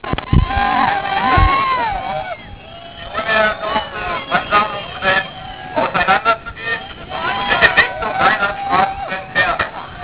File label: 8bit